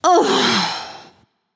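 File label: ugh, female; smite; voicepack; hera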